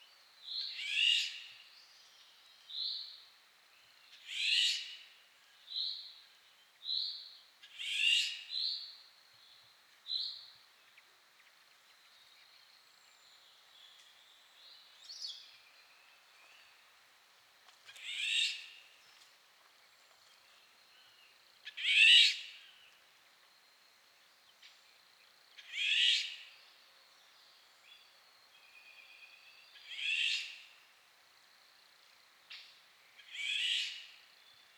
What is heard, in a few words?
Eurasian Golden Oriole